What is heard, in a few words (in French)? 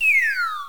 saut